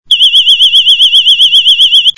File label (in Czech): zvuk alarmu